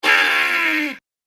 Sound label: Scream Witch; Screaming Sound Effects